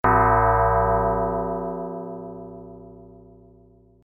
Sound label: player 2 coin